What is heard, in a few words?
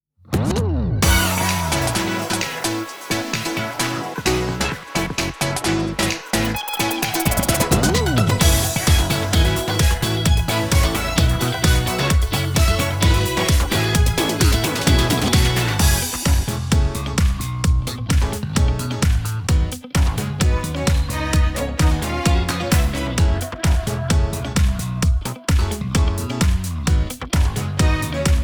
Instrumental; Destiny Child